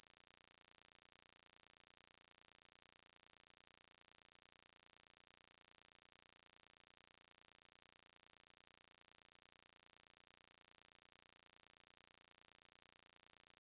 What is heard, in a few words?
squeaks